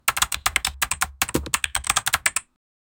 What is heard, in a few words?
klik klik klik clacking k, computer